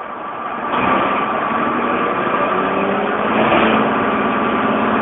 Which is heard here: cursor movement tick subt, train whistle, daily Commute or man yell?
daily Commute